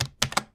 Keyboard - Key Combo; Keyboard Sounds

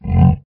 grunt, creature

